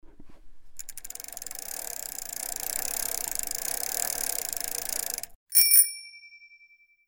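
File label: bicycle; velo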